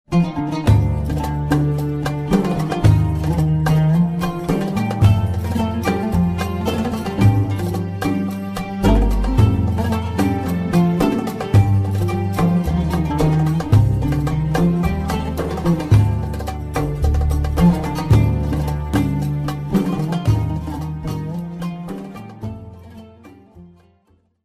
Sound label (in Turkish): SANTRAL MÜZİK